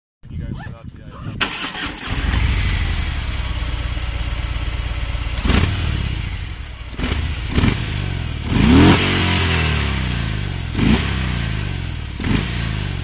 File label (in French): fat bob